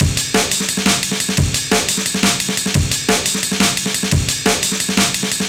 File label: Amen EQ, C, 175, classic dnb breaks